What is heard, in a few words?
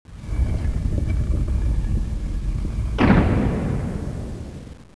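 stone door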